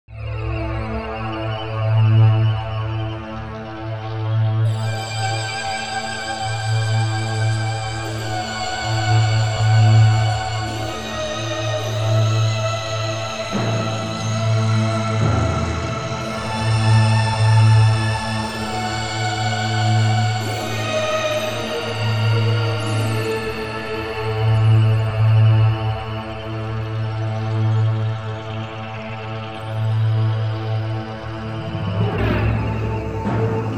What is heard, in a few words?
cartoon title